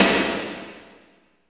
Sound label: SNR, Tekno Industrial; East West